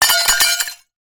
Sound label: impacts dish ceramic drop smash; zapsplat